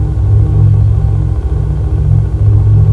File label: HQ ambient; 1997 MMX LAN PACK (win 95); Army Men